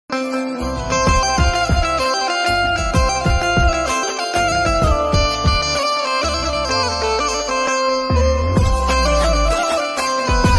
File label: piano ringtone piano piano music piano cover